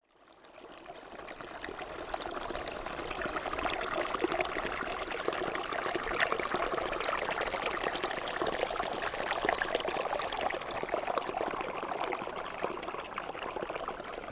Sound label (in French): Ruisseau Descente